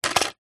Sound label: industrial hand tool plastic cheap down on concrete surface